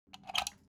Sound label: object household socket pull plug